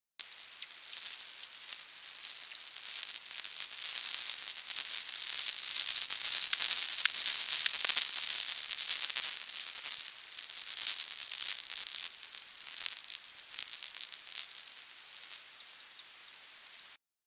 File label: freetail flight normal speed